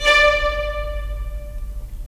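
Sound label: strings violins, right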